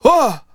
Emote Male Scared; Idle Champions of the Forgotten Realms

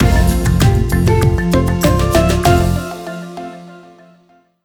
SLOW; Buzz! Junior Dino Den; PS2

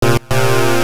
Incorrect; pc engine cd; Quiz Marugoto The World

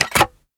Gas Open; gamerip; Car Simulator 2; android

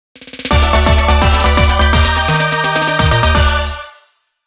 Victory Fanfare; Denpa Men: They Came by Wave

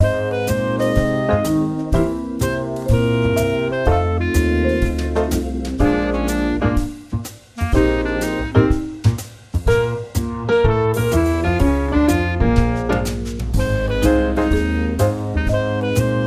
serving music

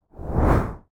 Turn Swipe; rebel cops 2019 gamerip

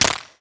skeleton impact

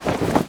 Cloth Destroyed; angry birds rio

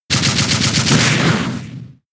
Drill Peck; Pokemon; Gen 6; XY ORAS

